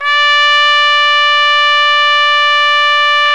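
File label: BRA TRUMPE, trumpet, brass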